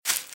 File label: Velociraptor - Footstep - Grass - Run - Left